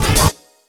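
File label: Cricket Oneshot, beats; Sonic Foundry (Sony Creative Software); Esoterik Beatz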